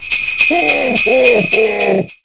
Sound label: hohoho